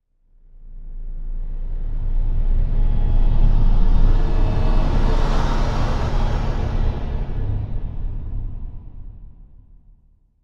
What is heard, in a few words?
Music Eerie Tonal, cymbal